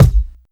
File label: present steel kick drum sound g sharp key, steel kick drum tuned to the G# note